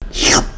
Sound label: item pickup